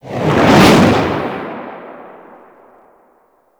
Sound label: Infested Golem Hand Swing; Warframe